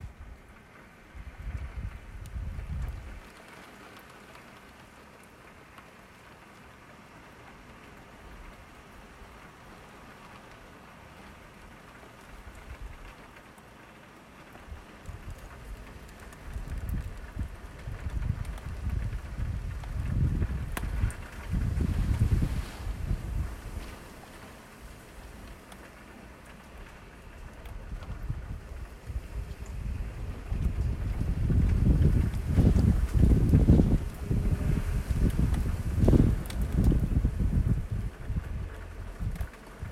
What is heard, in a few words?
storm sounds meditation